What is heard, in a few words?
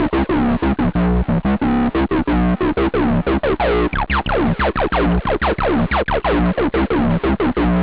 choke, techno; OctaMED SoundStudio V1